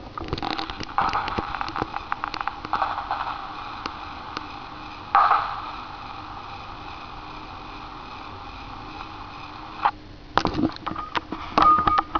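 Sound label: fireworks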